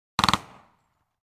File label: gun machine gun; NCH Library